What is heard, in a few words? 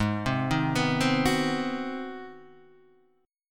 Ab 7th Sharp 9th, Guitar, Half Step Down